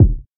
dry bass drum one shot d sharp key; kick drum

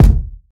bassy kick drum sample E key; kick drum samples

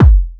00s smooth kickdrum sound g key; Duration 0:01; Samplerate 44100 Hz; Channels Mono

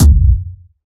big bass drum b key

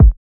perfect kick single hit g sharp key

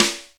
crisp snare drum sample f key

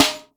smooth acoustic snare d sharp key; snare drum samples

